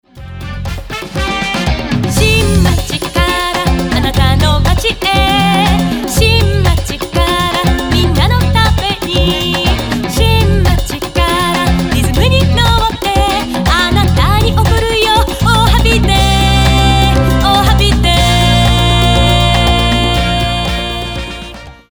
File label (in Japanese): サンバ; 新町サンバ